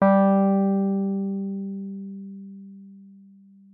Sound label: WURLITZER G3; Xsample VOL-1; WURLITZER RS